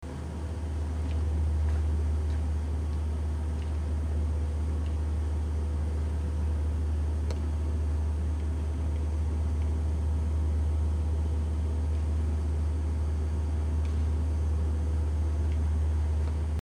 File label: HUMREC, Brummton